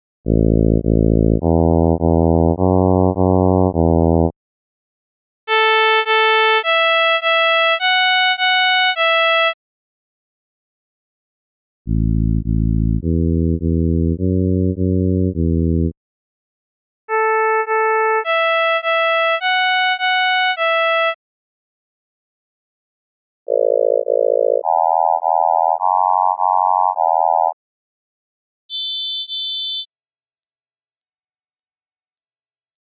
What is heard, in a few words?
Wiggly Waveforms